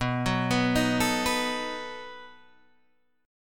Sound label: B 7th B down Guitar Standard; B7 arpeggiated; JGuitar